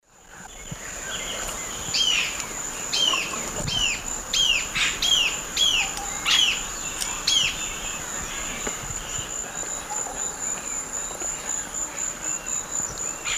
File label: Galbalcyrhynchus leucotis, calls; S. America; Eastern Lowlands